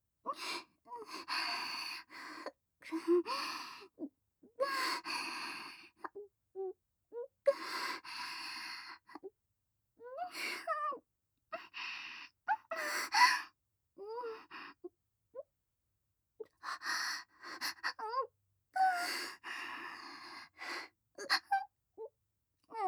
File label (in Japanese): 喘ぎ声; リネ